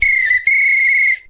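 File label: foudang groove; Best Service; World Colours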